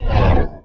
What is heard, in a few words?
Menu Horror